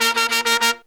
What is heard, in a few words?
Pop & Funk Brass